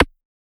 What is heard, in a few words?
perc; Slate; SD CAICOS Hip-Hop Sample Pack; suckerpunch